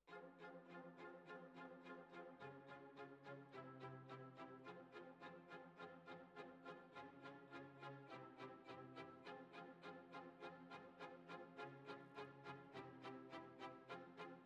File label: Violins, jazz for piano